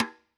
Pearl Piccolo Side Snr Off, drum

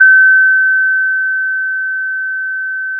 Sound of a school bell

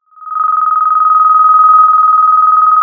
pop, Sound of a phone ringing

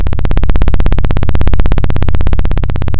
Sound of a plane taking off

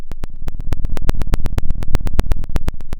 Sound of a fire crackling

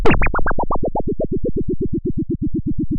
pop, Sound of a duck

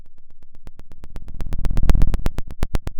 pop, Sound of a fire crackling

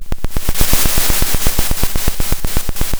Sound of a drum roll

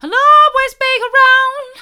i'll allways be around mid, vocal

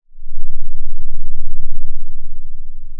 Sound of a thunderstorm, center

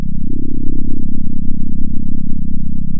pop, sound of a small motorcycle

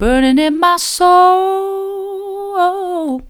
burning in my soul mid, vocal

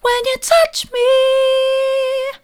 when you touch me soft, vocal

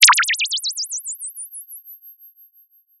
Sound of a bird tweeting nicely

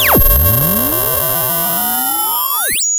sound of a bike bell

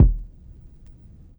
Vinyl Kick, drum

